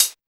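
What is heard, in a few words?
GARAGE CH, closed hat; Drums In Your FACE!; BONUS HATS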